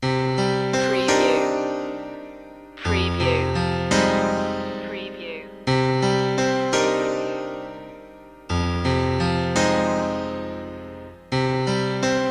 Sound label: sad piano